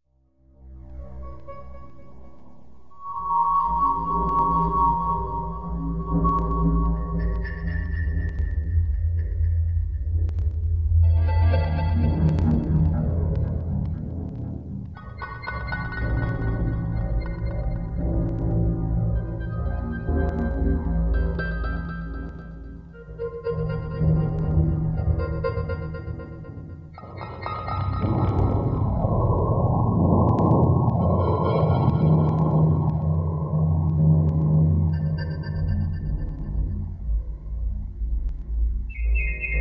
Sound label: Bb Augmented 60, Deconstruction